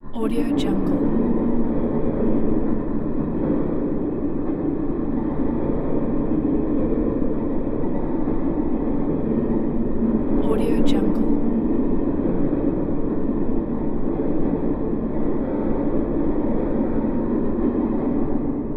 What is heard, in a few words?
space ambiance